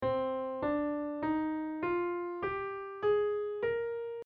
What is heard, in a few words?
C Natural minor Scale